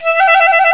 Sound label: FLT FIFE, British Fife, Fife Trills; Roland LCDP04 Orchestral Winds; 90 s Sample CDs